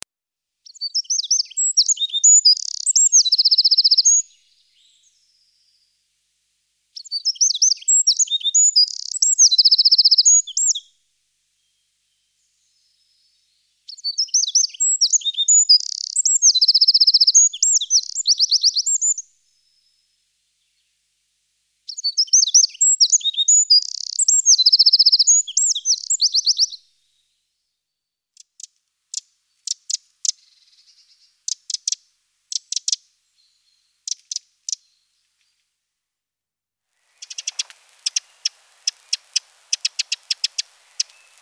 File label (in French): troglodyte mignon, oiseaux